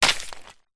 foley stone large drop ground light debris

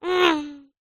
human child car noise mouth pass; zapsplat

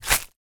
foley footstep dried leaves brush

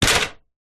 industrial step ladder metal set down on side

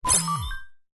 science fiction door code unlock accept

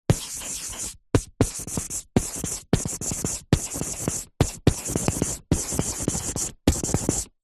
office marker write whiteboard

